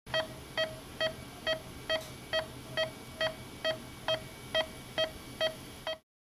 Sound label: Neonatal Heart Monitor; Fast Beeps With Some Airy Room Tone; respirator